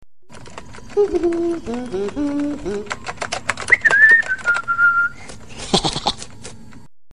Whistling; Minion

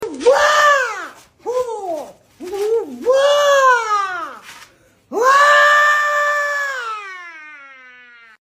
Sahurrrrr woaaaaaa; dance

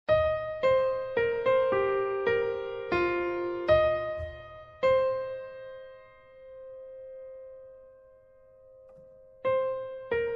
menu music; untitled goose game

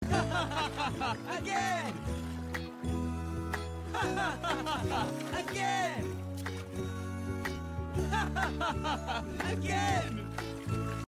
Hahaha Again; Trolls

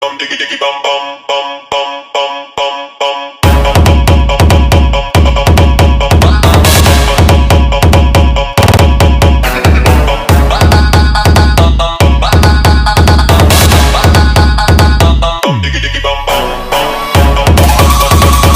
Full Beat

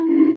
Bonk

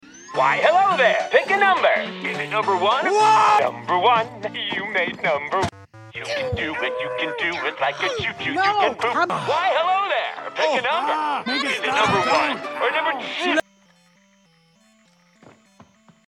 chime; smartypants; Im not dead yall

